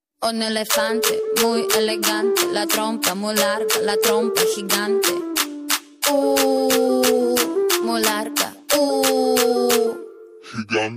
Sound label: Bass Boosted